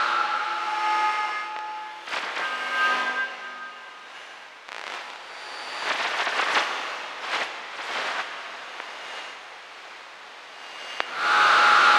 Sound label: AMBNT 160; Ambient